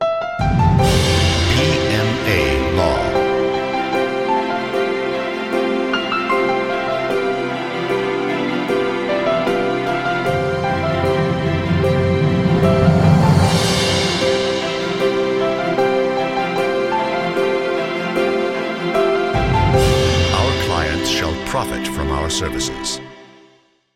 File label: corporate sound